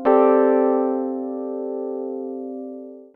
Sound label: SYNTH Piano; Interbellum